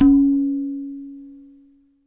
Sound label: TOOM, NYC Percussionworks; Sampleheads; 90 s Sample CDs